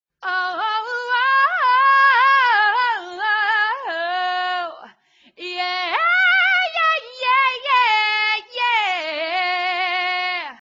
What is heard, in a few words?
vocals